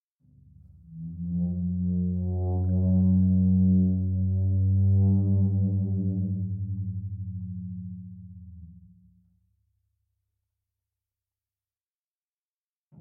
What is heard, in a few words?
Instrument Floor Tom Drum Friction Mallet Med Vibrate; ShotgunMic; Guild Wars 2 (Original Foley Soundtrack)